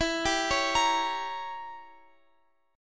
E Diminished 7th, down Guitar Open F; Edim7; arpeggiated

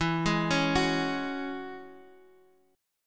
E 6th Add 9th E down Guitar Half Step Down

